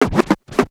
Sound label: Scratch; 90 sSampleCDs; Coldcut's Kleptomania VOL1